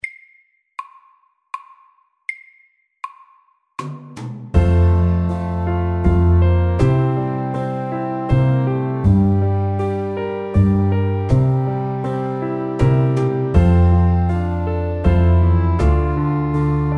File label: Soft rock MEDIUM 80